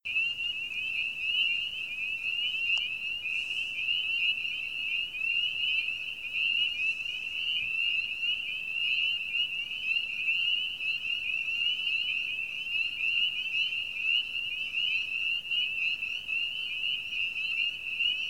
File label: spring peepers; the virginias